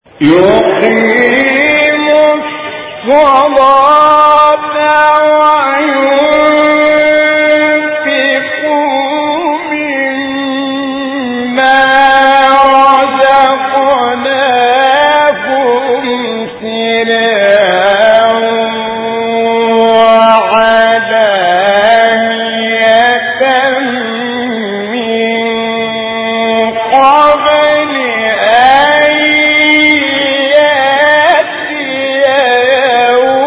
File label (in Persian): تلاوت زیبای آیه سوره مبارکه ابراهیم; 31; استاد عبدالعال